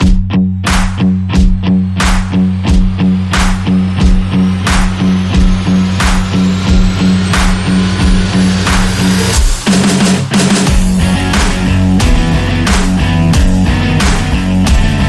No Lead No Vox No Brass

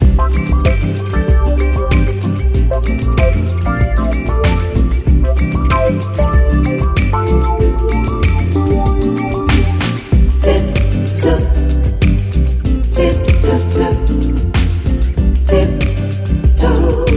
Bossa Nova Beach

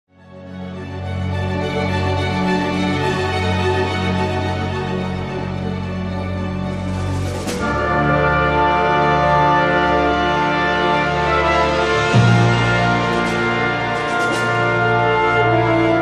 Dramatic

